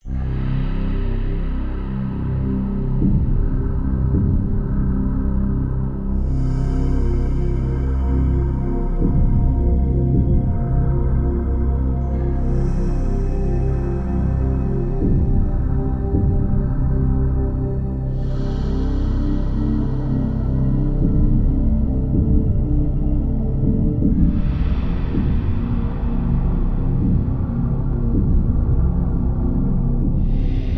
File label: Ambience Theme; Metroid Prime Series; Metroid Prime 3 : Corruption